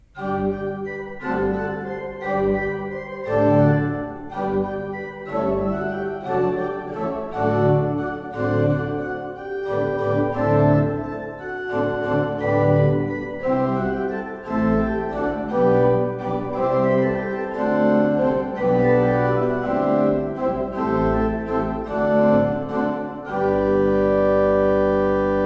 Stahlhuth, gebrauchtorgel

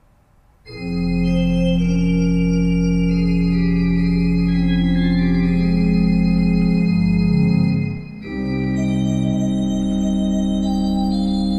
Fleiter 19 II P, orgel

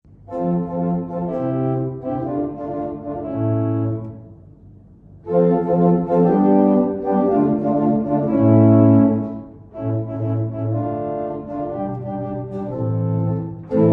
Orgel, pfeifenorgeln; Benson

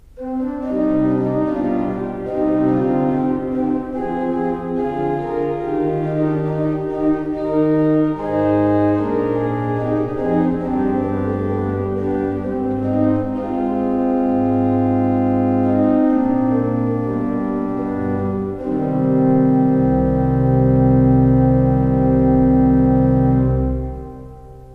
Breil, gebrauchtorgel

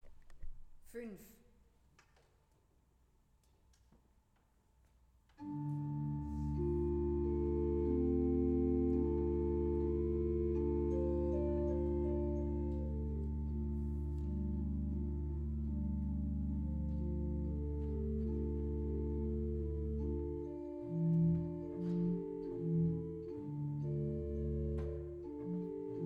Weigle, gebrauchtorgel

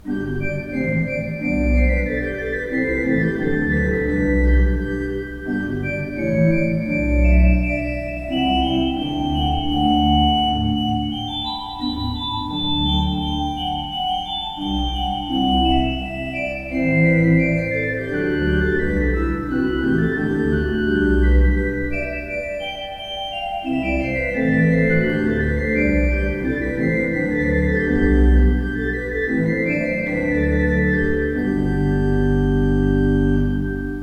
Breil, gebrauchtorgel

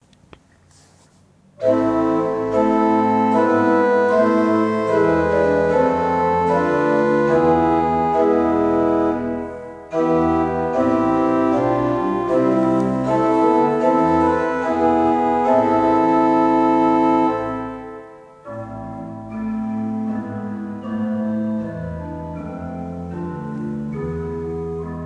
Breil, gebrauchtorgel

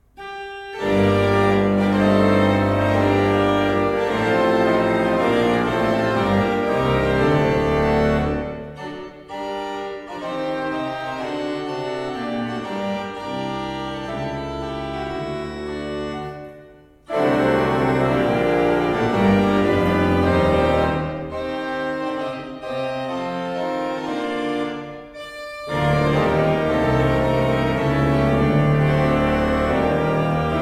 Bosch Raupach, gebrauchtorgel

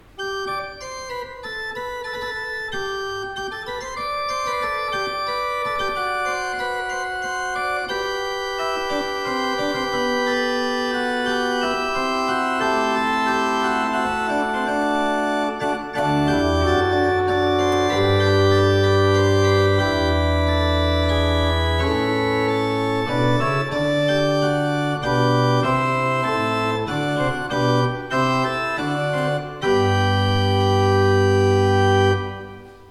Peter, gebrauchtorgel

